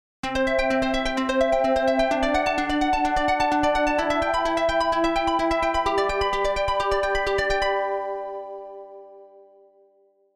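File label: Pluck arpeggiator; Pluck arpeggiater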